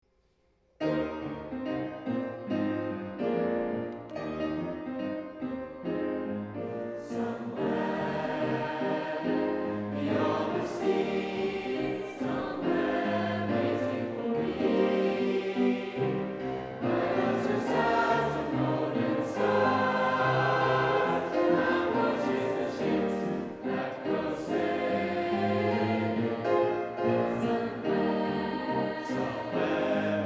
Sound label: 6th form choir; Stover School